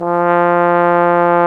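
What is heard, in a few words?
brass, Solo T.Bone; Roland L-CD702; Solo Bone 3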